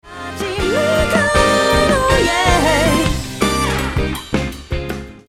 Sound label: Horn